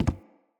Walk Wood, Footsteps; Characters; Enemy; Mockeries; Mockery

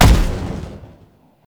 Rifle Explosion; Warframe; Venus; Venus Vip Rifle Spaceman Agent

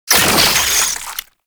Brood Spider Spawn; Warframe; Oraxia

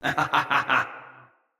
Laugh, Battle, Barks, Dialog; Mendicant Wazard

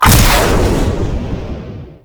Jetpack Melee Launch Up; Warframe; Veilbreaker; Sprag

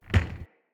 Wood Stool Impact Light

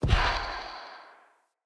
Fey Turn To Stone Bird Destroy; Powers

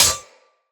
Melee Sword Impact Generic

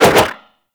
Golden Band Staff Spin Whoosh; Warframe; Monkey King

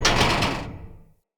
Pipe Hatch Open; Encounters; Ode Pollution Tower

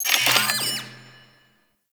Sercurity Camera Un Alerted; Warframe; Security Camera

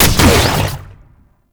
Archgun Shot; Warframe; Weapons; Archwing; Tn Nokko Archgun

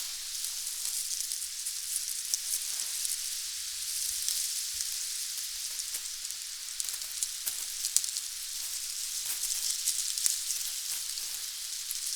Tree Leaves Wind Loop

